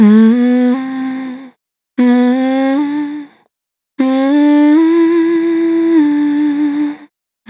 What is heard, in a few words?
Single Voice Melodic Loops, Vocal, Dry